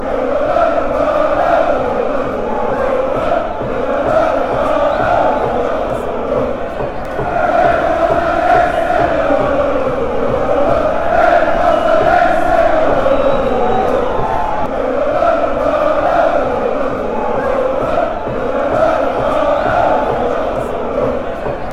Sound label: chant; genk